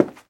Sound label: carpet step; Golden Crayon Sounds